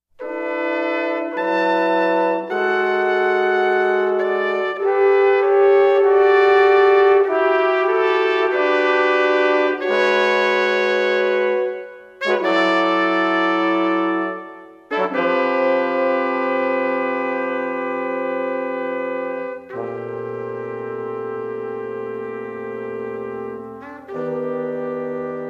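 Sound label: Miniatures for Brass Quartet III Adagio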